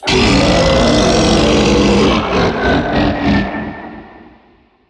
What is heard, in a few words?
beast vocal spit attack